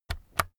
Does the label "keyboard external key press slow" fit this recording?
Yes